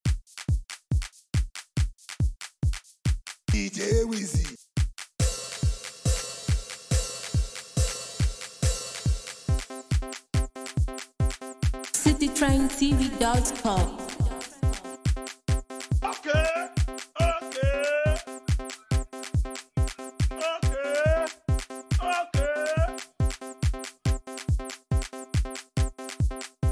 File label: Cruise Beat